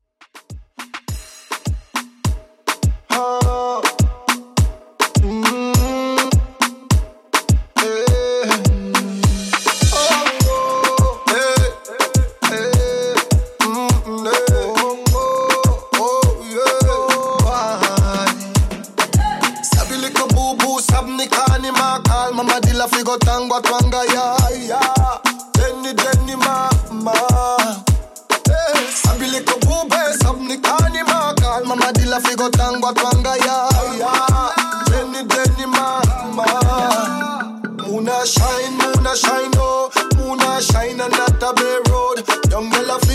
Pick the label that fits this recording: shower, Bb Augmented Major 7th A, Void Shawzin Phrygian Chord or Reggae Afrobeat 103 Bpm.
Reggae Afrobeat 103 Bpm